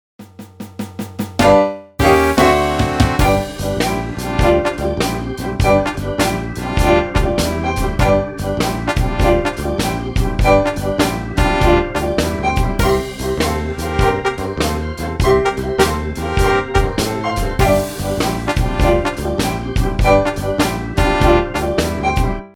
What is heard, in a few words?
Backing Track